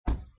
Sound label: door